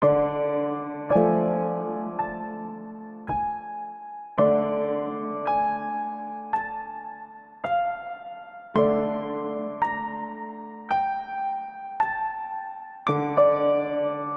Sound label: Rainy Piano - Dmin 110 bpm; MIDI Clips; Tonal; Motifs; Ableton; Mood Reel